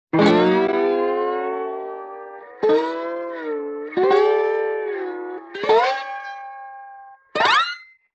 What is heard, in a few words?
Steel Guitar Slide Ups; Vintage Cartoon; Musical Elements